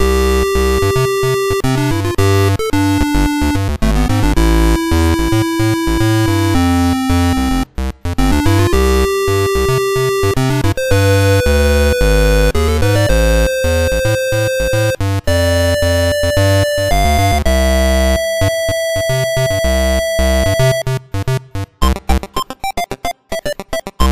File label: 8bit; Scribblenauts Unlimited